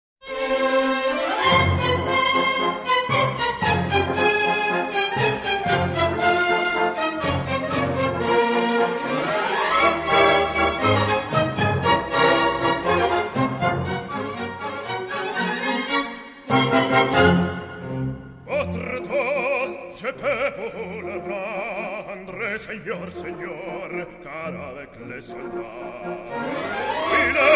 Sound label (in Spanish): baritono